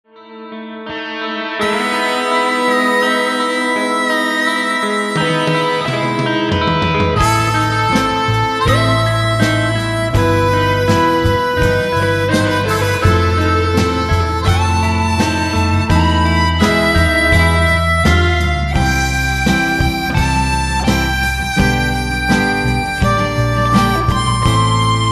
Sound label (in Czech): harmonika